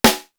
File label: RIM SHOT; East West - Global Sounds; D4 BD SD TOM